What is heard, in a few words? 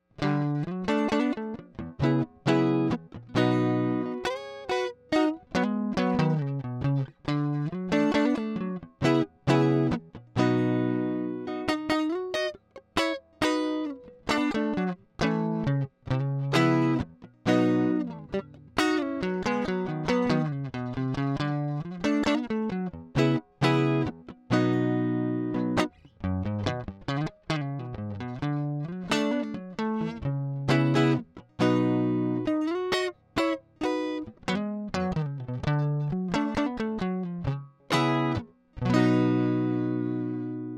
strat